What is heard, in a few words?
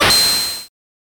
Begin Stage; witch and hero 2 gamerip 3ds switch